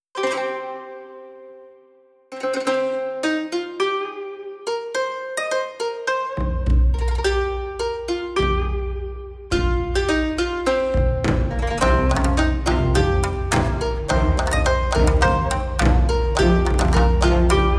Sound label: Orchestral; katana soul